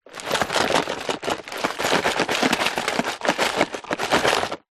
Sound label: industrial tool box small plastic shake